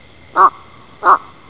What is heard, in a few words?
american bittern